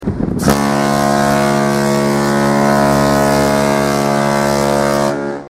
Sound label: horn; queen mary 2